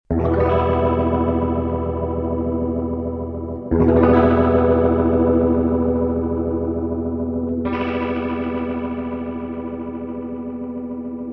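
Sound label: clean electric guitar cls222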